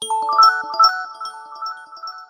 Xiaomi Redmi Poco X3 Pro Notification Ringtone

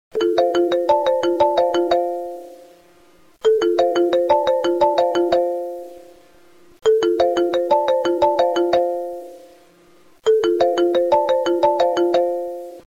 iPhone 12 Mini Default Ringtone

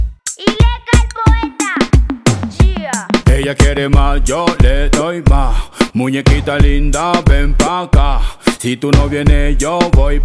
Hmm Haa Hmm

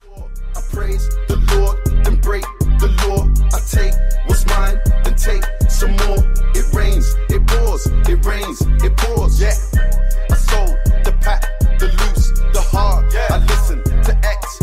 English Remix

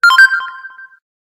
Transmitter Notification; Samsung S21

